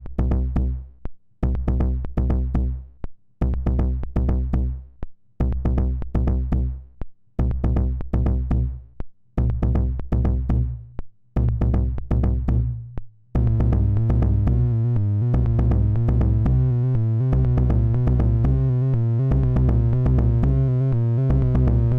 KICK DAMP CV; Snazzy FX

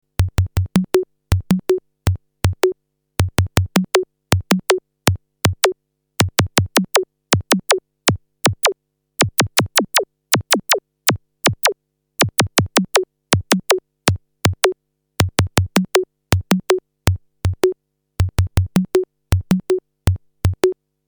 zapp

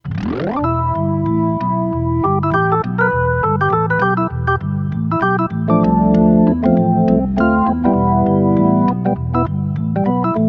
B3 2nd Perc

